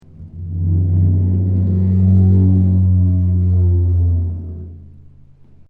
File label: drum rub